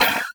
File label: Multimedia Internet CD-Rom Flash Wipe